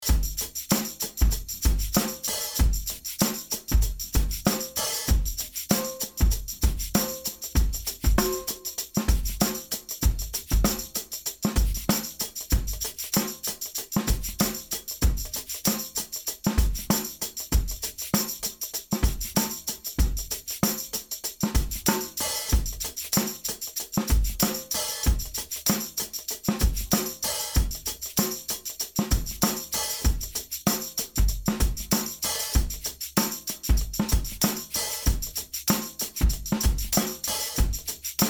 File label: beat roots bridge